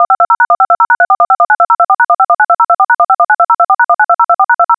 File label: dtmf